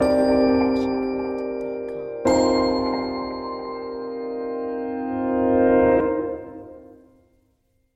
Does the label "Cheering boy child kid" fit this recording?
No